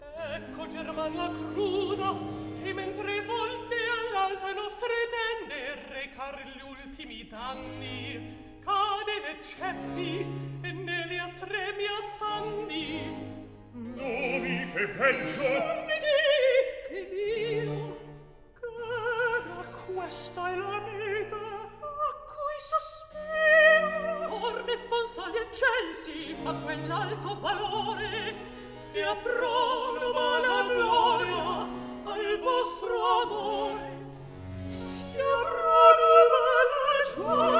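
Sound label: Recitativo, Handel opera; Act 3; Ecco, german, la cruda; Rinaldo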